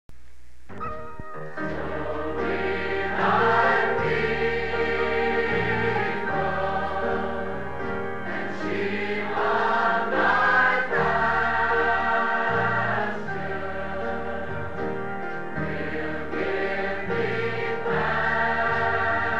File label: So We Thy People Choir